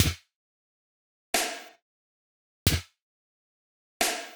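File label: snare early reflection, reverb; 军鼓早期反射; Waves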